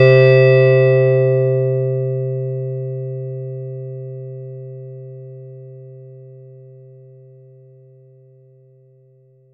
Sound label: Chord; ModelCycles